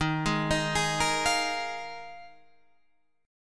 D sharp Suspended 2nd Suspended 4th, Guitar, Half Step Down; chord